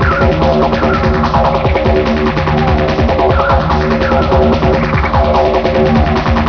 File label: digeridoo